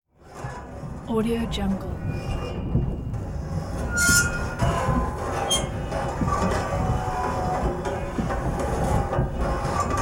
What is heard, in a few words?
ferris wheel mechanical noises; hollywoodedge